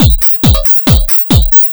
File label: drum 138, Techno drum loops; Techno ID; PS-45